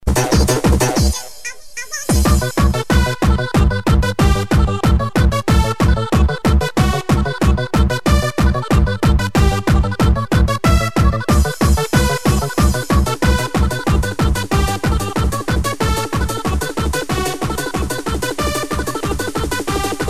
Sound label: chav dance song